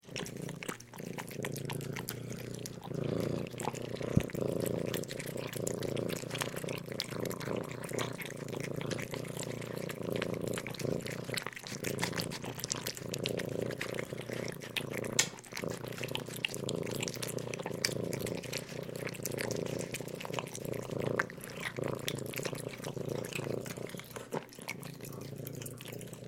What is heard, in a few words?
kittens two eating and purring